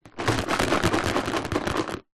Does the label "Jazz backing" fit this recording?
No